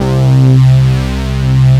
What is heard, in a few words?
FAT FUZZER, TECHNO; Pro-Rec - Synthsonic; Z1 TECHNO